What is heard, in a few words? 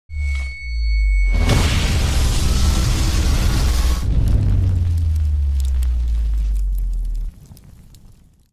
Super eye lasers heat vision